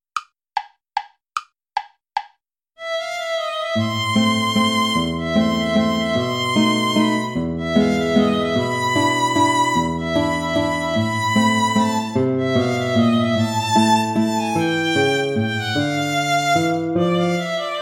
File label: play along without guitar